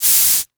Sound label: Hydraulics